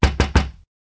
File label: Knock, notifications; Mi Mix 2S Stock Ringtones